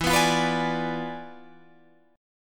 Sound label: E 7th Flat 9th E down Guitar Drop D